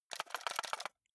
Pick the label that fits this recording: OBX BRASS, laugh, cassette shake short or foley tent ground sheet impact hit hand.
cassette shake short